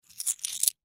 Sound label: Coin In Hand, Foley; Coin Pirate Gold In Hand; Coin Movement; Blastwave